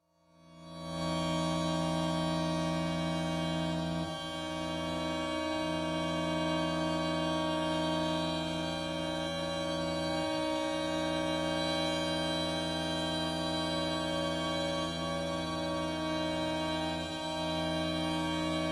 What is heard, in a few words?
String Quartet